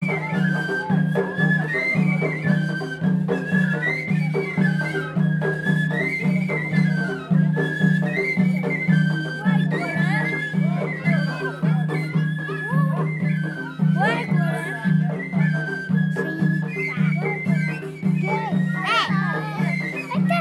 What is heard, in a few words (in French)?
Musique mariage Tadjik